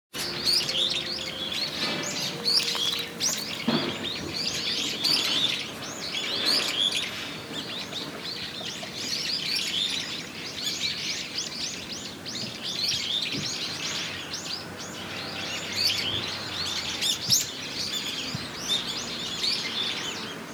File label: voice sparrow